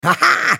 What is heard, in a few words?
ultimate effort; Heimerdinger